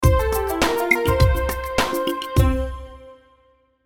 Musical Tribal, Musical Tribal Beat Keys Melody Happy; Musical Sound Design; Blastwave